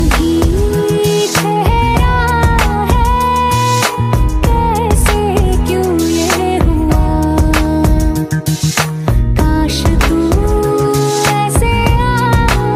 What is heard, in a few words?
bollywood music